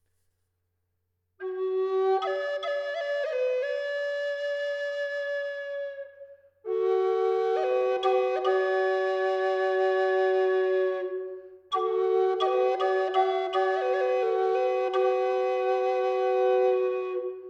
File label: G Drone Classic